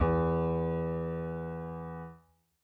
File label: piano e; Golden Crayon Sounds